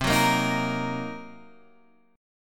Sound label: C Minor 7th Flat 5th Guitar Open G, chord